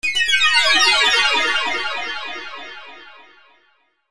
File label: Magic Harp